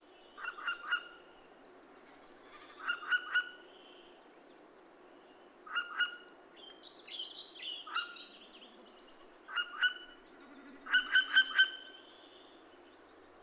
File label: Northern Hawk Owl Vit vit vit calls of adult